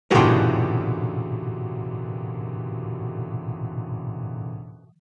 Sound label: jumpscare; hello neighbor